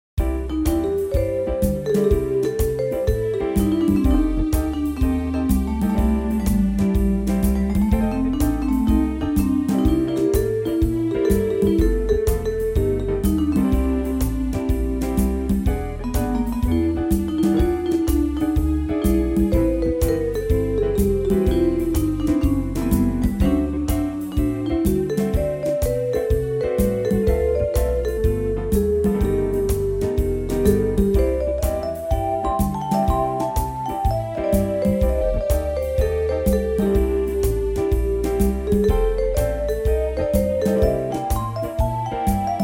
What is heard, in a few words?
Fantacy Jazz